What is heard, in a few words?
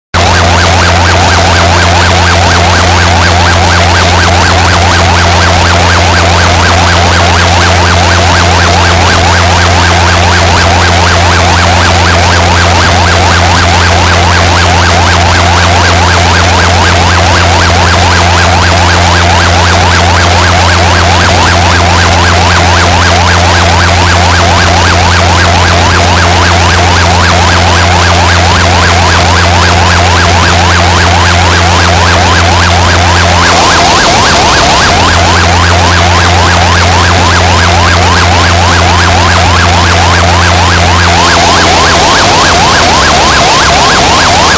Morning Noise Broadcast